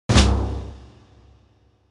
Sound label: Equip Grenade Eggs; Banjo-Tooie